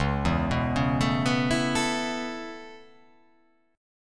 C sharp Minor 7th Sharp 5th

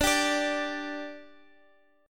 G 5th D, chord

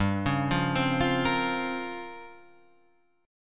A sharp Minor 6th Add 9th G, Guitar chord

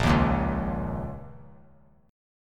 A sharp Minor Double Flat 5th, A sharp, down; A#mbb5 strummed; JGuitar

